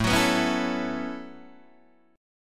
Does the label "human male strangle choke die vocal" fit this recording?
No